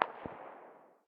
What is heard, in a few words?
ar very far distance, 5.56x45mm; tacz-tweak-pack; taczmechanics